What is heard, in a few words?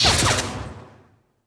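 lightsaber hit high; weapons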